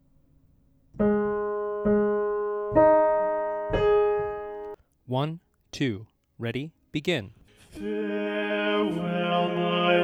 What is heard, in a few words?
bass rehearsal